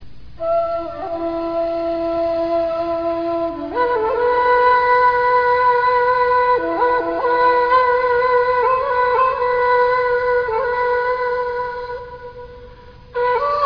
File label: flute